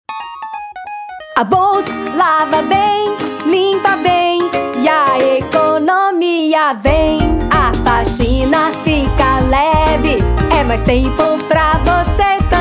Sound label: jingle; jingles comerciais